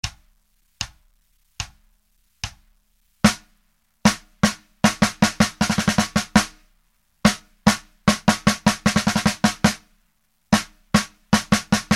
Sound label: Thirty second notes